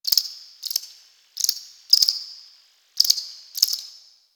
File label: Cricket; Warframe; Quests; The New War; Ambience; Zariman